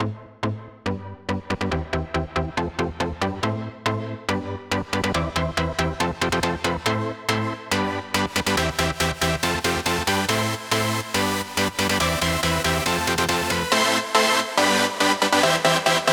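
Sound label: 140 BPM Chords no sidechain; Vengeance - Essential Clubsounds Vol.5; Demo Constr Kits; Nessi Kit Root A; Synth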